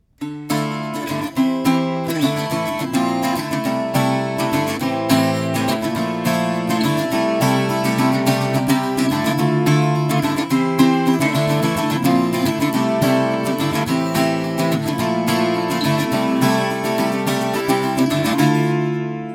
bouzouki di et mic stereo; audient mico

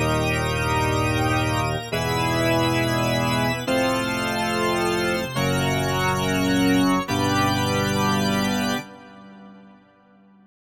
theater organ FM; FM/digital sounds; Nord Wave

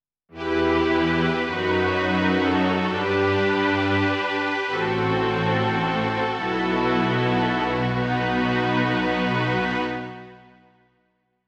strings sec narrow sample, Clavia Nord Wave

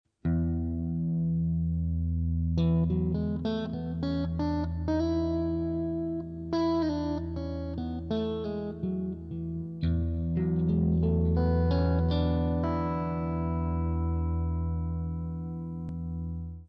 mixo scale

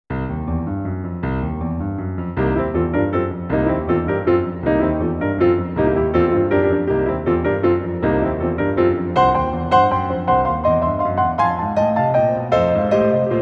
far from piano; quikquak; rayspace

